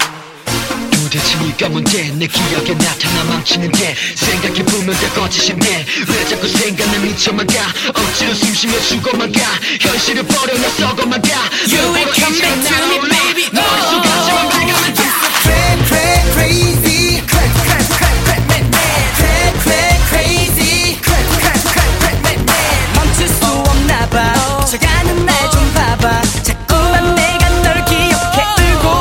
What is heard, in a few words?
Clap